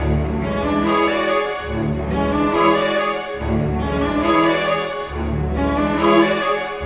Cinematic Strings, Orchestral

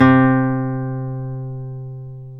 guitar classical guitar, nylon string; GTR; Roland LCDP02 Guitar and Bass

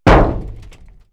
Hard'n'Hollow, perc fx; X-Static Goldmine

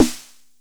Bass Snare, Jomox Airbase99, Drum Machines

